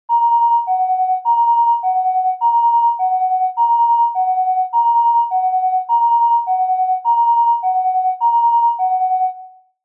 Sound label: Ambulance Siren Close